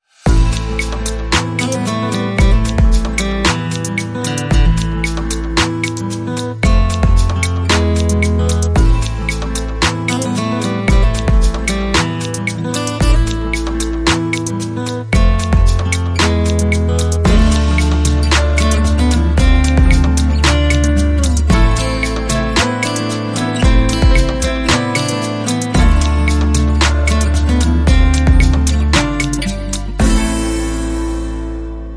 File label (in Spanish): Buena Guitarra